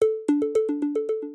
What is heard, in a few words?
ring